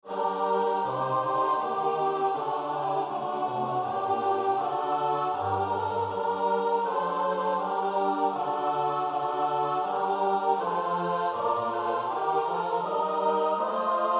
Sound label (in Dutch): Mijn lieve Jezus (S-A-T-B); Bach (Johan Sebastiaan); BWV 244